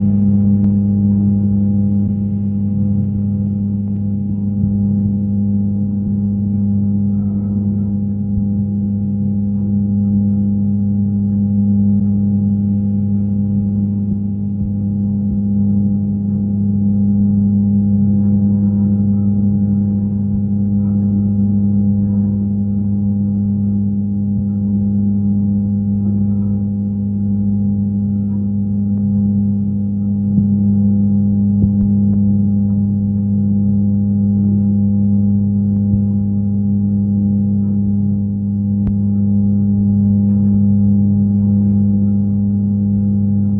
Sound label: industrial electric transformer box hum vibration; felix blume